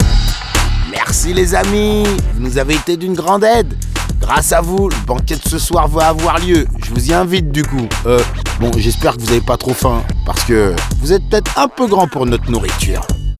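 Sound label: Outro Victory French; arthur and the revenge of maltazard; wii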